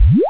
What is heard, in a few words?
powerup; outpost